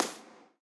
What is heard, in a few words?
tile, footsteps